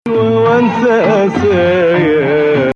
Nahawand

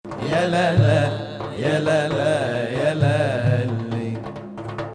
Hijaz

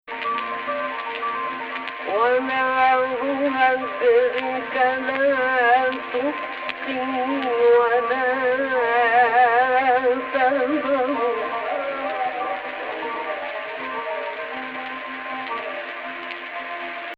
AHH, Egypt Rast

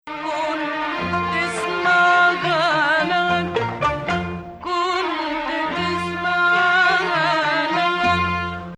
Ajam Ajam-flat6

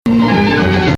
Bayati (lazima); Bayati 1

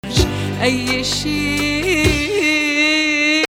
Nahawand, suspended 5 (like Husseini); Nahawand 4

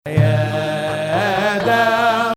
Ajam

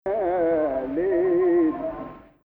Rast Fein Ya Gameel Rast; Maqam Rast

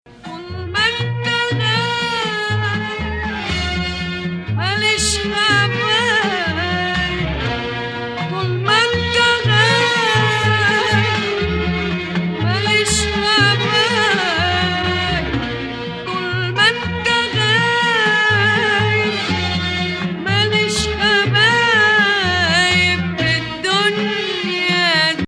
Nahawand; Maqam Rast

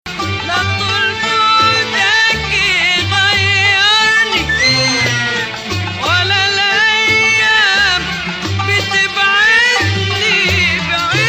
Bayati Octave

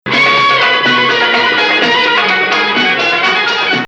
Bayati Jiharkah; Ajam 3

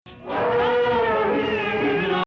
Rast Sihtu Nahawand

